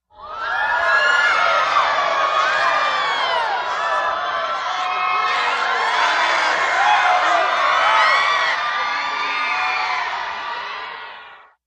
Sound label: Theater crowd, horrified oooh with screams